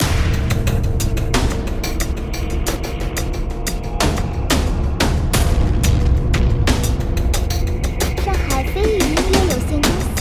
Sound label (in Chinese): 危险紧张; 星际卫队